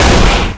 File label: Damage; Ruu Goblin Quest